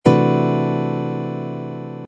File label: c major thirteenth sharp eleventh chord voicing, guitar